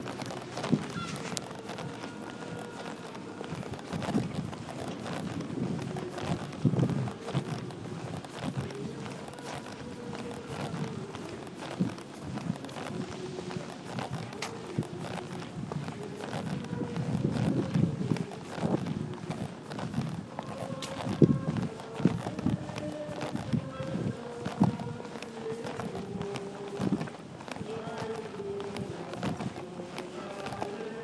outdoor easter morning mass; st philip's cathedral

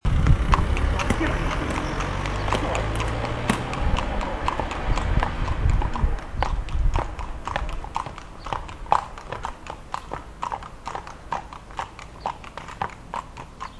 horses passing my window

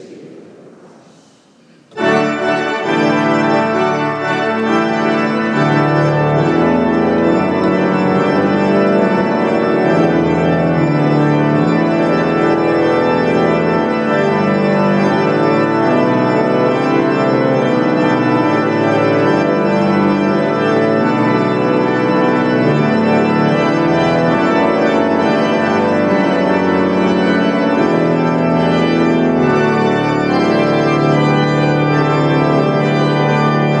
improvisation on veni sancte spiritus

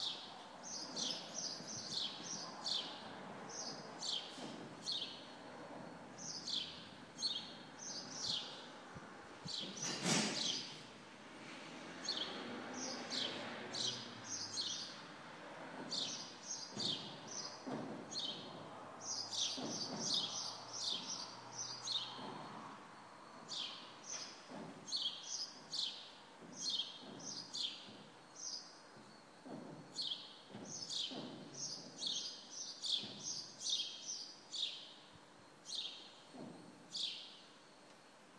bird song from the workshop door

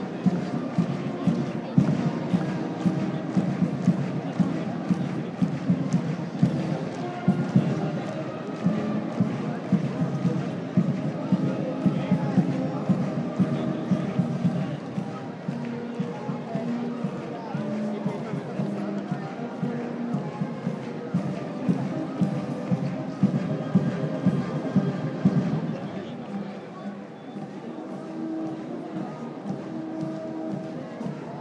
brass band in il campo; sienna's

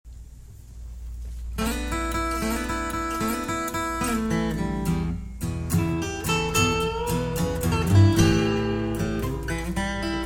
blues in e

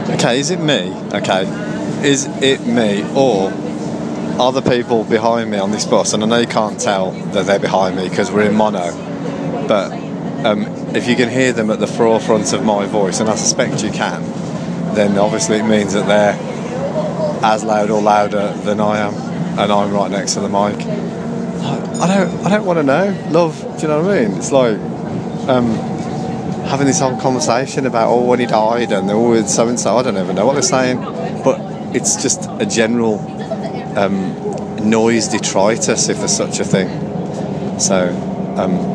noisy people

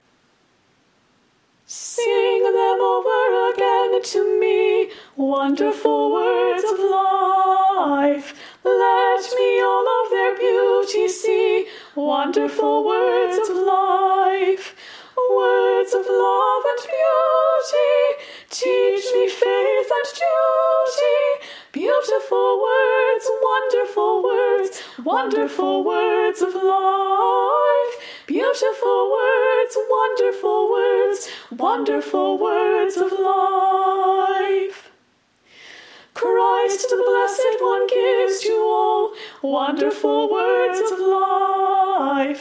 very impromptu version of a lovely hymn; sung at my church on march 8

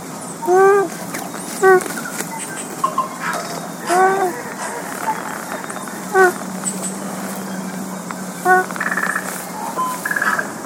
takahe soft contact calls

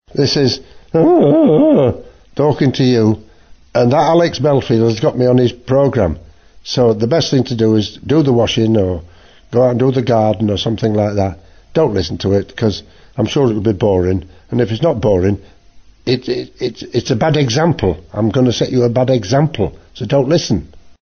jingle; sir jimmy savile

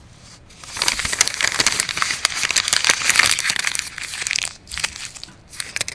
rotherham railway station

